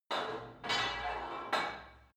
put weight onto barbell reverby quick, fitnessstudio